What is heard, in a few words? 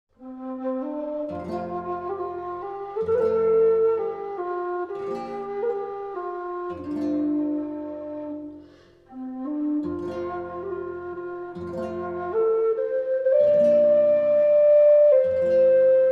whistle low c; atma